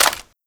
mag out; weapons

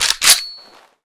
m14 charge, weapons

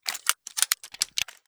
sterling stock unfold

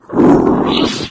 leap, flood

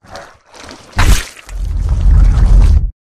unarmed melee; flood; carrier; predatorcz; halo

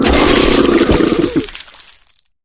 flesh destroyed large; flood

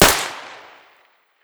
sterling suppressed fire

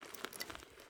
generic cloth movement, weapons handling

